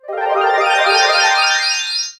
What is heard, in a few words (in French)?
glis desc mel